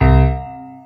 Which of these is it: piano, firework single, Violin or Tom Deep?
piano